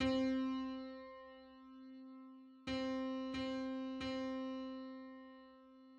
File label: Five-hundred-thirteenth harmonic on C